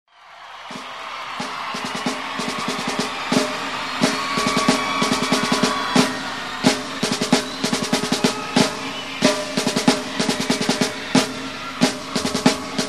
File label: Drumbeat